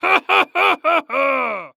Human Male Laugh Jolly Giant Laugh

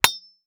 Weapon Sword Hit Wood; AdobeCC

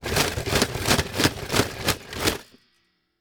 Foley Plastic Guns Plastic Bin Rattles

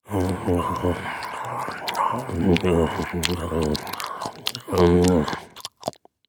Horror Scary Human Chew Monster Scary

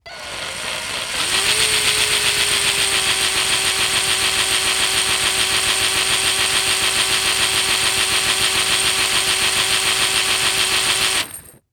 Industry Tool Power Drill Medium Speed

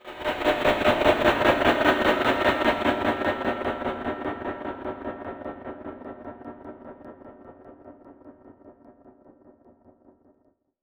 Title Transition Chopper; Adobe; Production Element

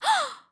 Human Female Gasp

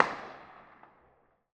Firecracker, Medium Distance; Membrans; Firecrackers Sound Pack 01; GDC Game Audio Bundle

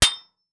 Sword Hit Thin Metal Shield, weapons; AdobeCC

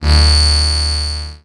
Flash Beep Long; Multimedia Internet CD-Rom Flash

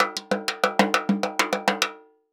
Cartoon Drum Beat

